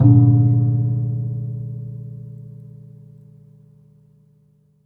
Classical Guitar Marimba Hit, Classical Guitar Extended Technique